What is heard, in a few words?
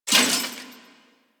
Melee Heavy Air Slam Impact Metal; Weapons; Whooshes; Warframe